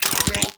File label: spear gun charge up; Warframe; Weapons; Corpus